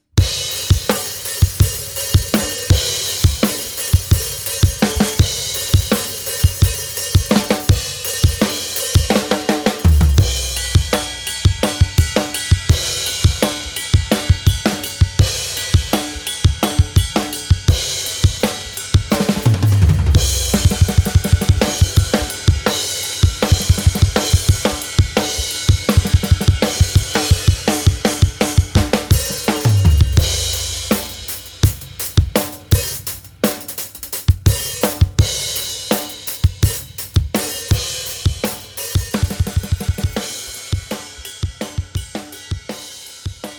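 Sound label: Meinl Classics Custom Extreme Metal, cymbals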